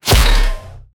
magical spell cast short

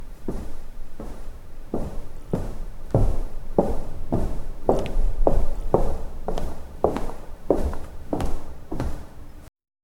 creaking floorboards slow

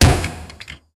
punch crater impact; impacts

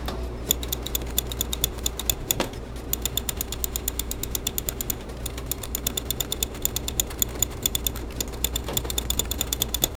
inside a small sewing fac, factory

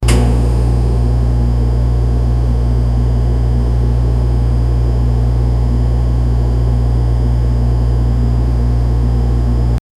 fridge hum empty echo; home appliances

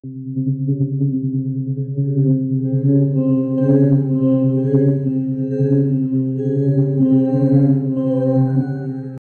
background music subdued

